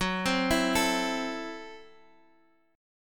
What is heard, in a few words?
F sharp Minor 7th Flat 5th, Guitar Open F; F#m7b5 arpeggiated; x,x,6,7,7,4; chord shape